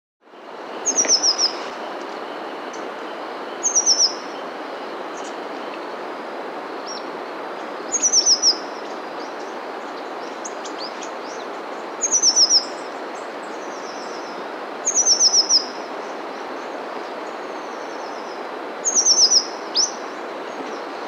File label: White-Winged Tanager (Piranga Leucoptera), Andean Birds; S. America